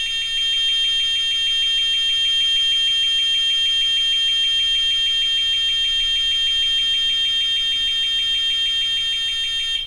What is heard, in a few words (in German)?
lupusec rauchmelder alarm